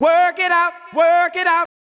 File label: deep house male, 125, A, vocals